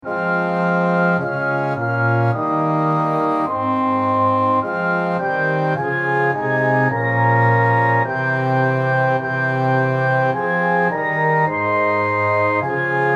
Orchestra, hymn

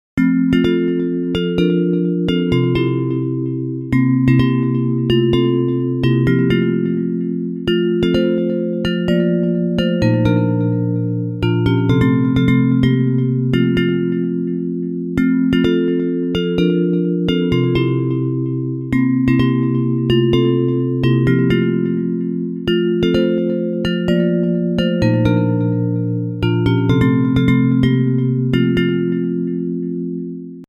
Where He Leads Me Bells, hymn

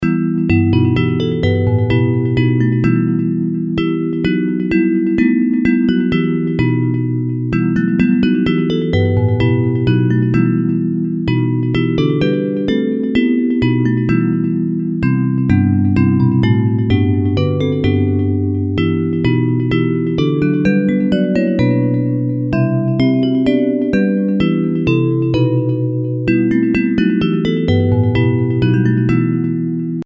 Bells, hymn

